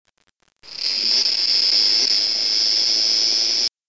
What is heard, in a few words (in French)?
cigale